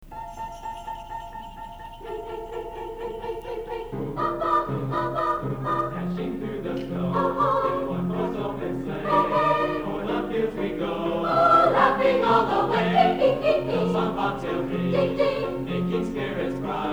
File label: Jingle Bells